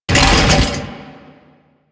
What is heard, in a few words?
Bodyfall